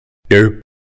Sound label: DERP Sound; Meme Button; memes